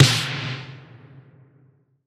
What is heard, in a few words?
Snare Vapor